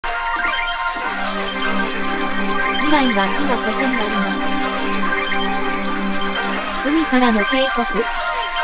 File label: Clean Wave Pipes